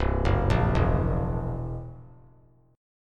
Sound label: C 7th Flat 5th C down, chord; C7b5 arpeggiated; JGuitar